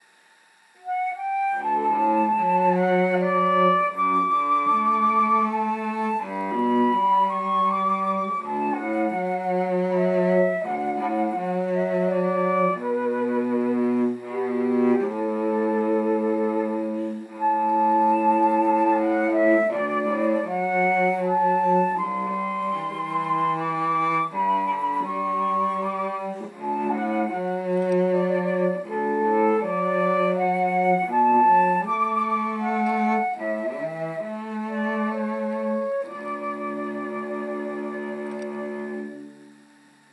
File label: Flute Cello Duo